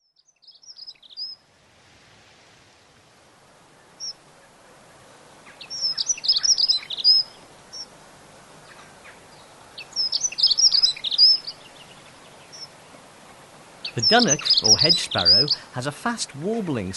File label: Dunnock; Fauvette d'hiver; bbc Bird Song Site